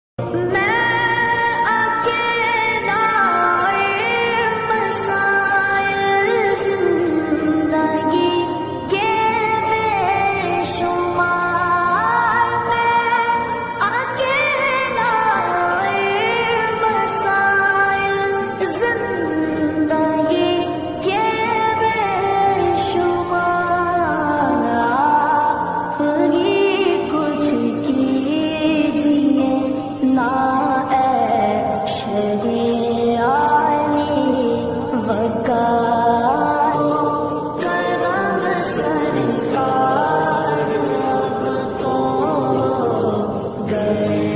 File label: islamic ringtone, naat ringtone